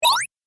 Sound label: Arcade Game Single Laser Zip; Video Game Sound Effects; Retro Inspired Sound Effects - Think Nintendo, Atari, C64, Etc.